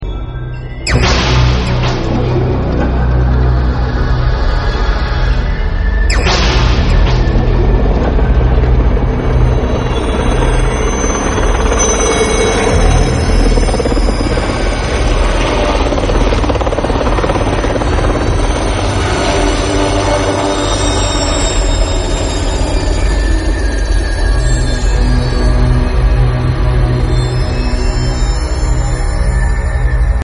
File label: instrumental mix